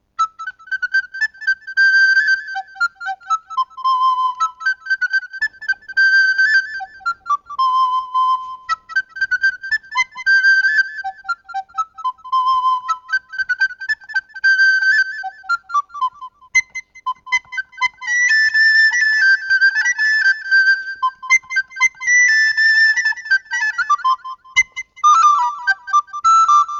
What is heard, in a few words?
Grand Polka; Philadelphia Assembly Grand Polka (Edward de Roland); tenor recorder; MelBay